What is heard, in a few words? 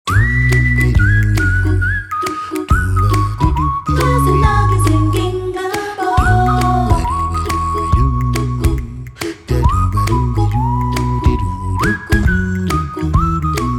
Youth Choir